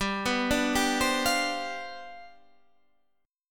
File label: C Major 7th Suspended 2nd Suspended 4th, G, chord